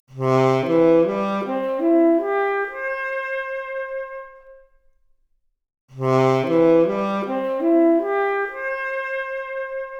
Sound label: Saxophone arp, Stereo output; 3bit